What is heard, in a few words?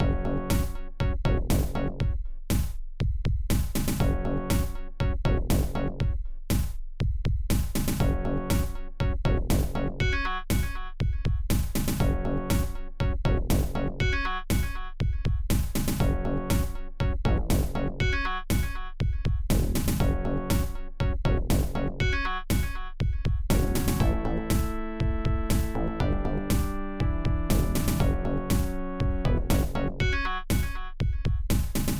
music